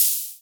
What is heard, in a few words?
Rides, Cymbals